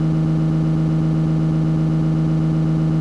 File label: engine; DeadlyRace